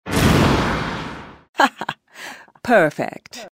alert sound

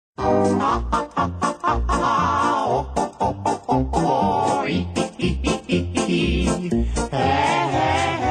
hahaha hohoho hihihi hehehe

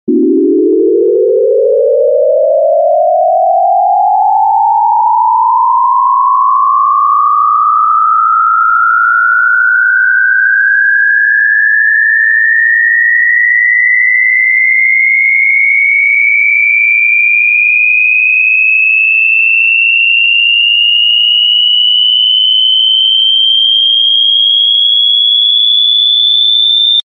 Extremely painful sound effect; extremely painful noise

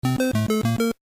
underground beat; mario

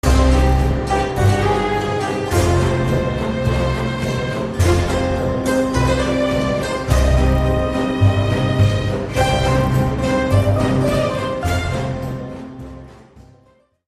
theme melody; bbc sherlocks

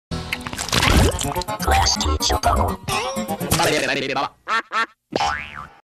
robot logo; Klasky Csupo

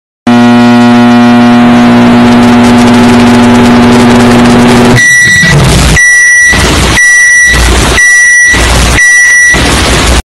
microwave

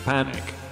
panic; Cowbelly Panic

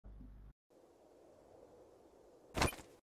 c4 pickup

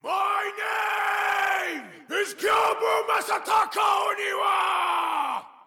horse; sekiro; horse boi

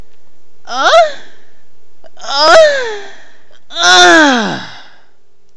moan

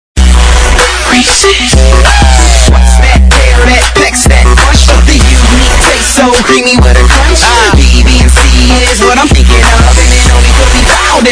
rap

